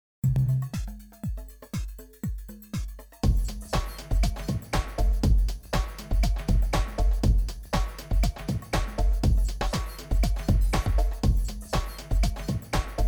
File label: music